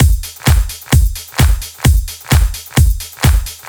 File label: Drum Loops, 130 BPM, Underground UK House & Electro